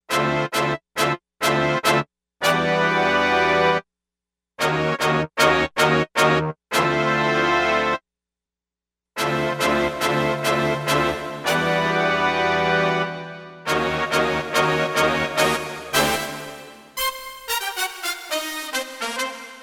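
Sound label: Reverb Echo Morph, Lexicon MPX 1; Factory Programs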